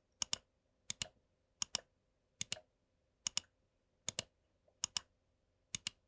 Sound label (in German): seitentasten